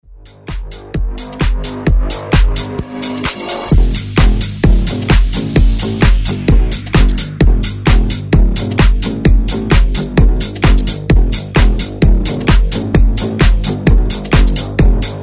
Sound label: Dance Ext Redrum